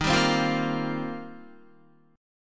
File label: Eb 6th Add 9th, Guitar; Eb6add9 strummed; 11,10,10,10,10,12; JGuitar